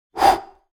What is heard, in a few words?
Human blowing short